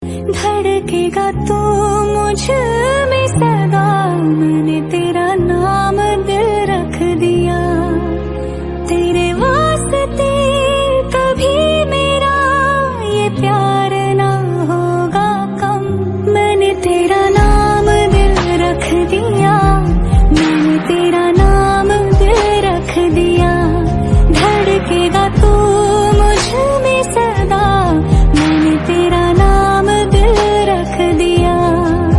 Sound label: Female Version Hindi Song Ringtone